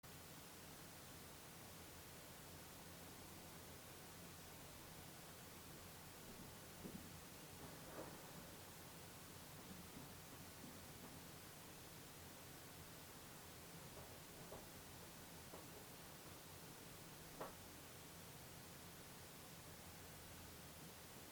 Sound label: footsteps, movement; Devoe House; EVP